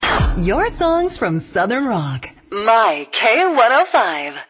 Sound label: southern rock